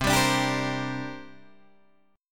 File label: C 7th Suspended 2nd C down Guitar Open D